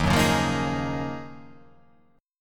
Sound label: Eb Minor 11th Eb down; Ebm11 strummed; JGuitar